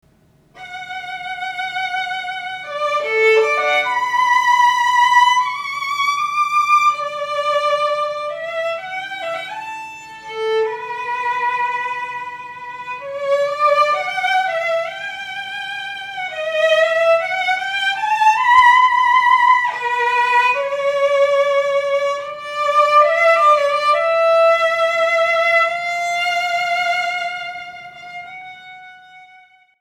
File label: violins; Catellani; 2002